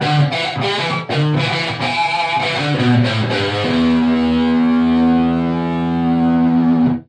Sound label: straight up guitar lick